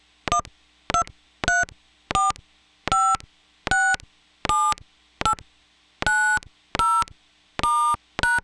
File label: all tones